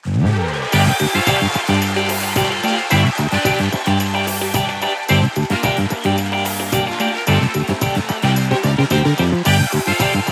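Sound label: Jingle Special Loop; Pilotwings Resort; 3DS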